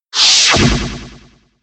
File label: spiral inward; typing; tf2ware ultimate